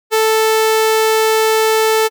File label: sawtooth wave phase scrambled